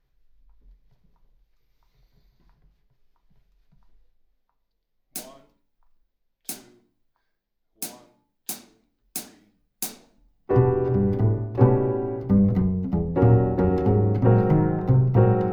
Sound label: Slow Tempo Bass and Piano